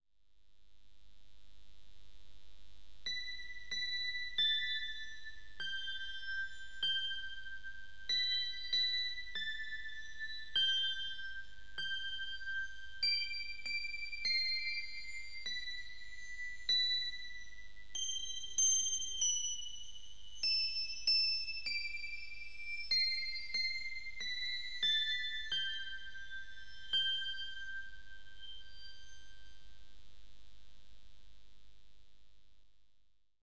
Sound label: music